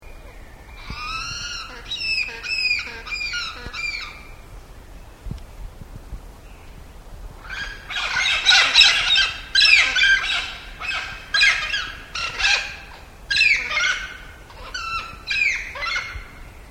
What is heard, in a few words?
tucuman parrot